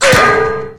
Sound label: Metal hit; Nickelodeon Super Brawl Universe; Android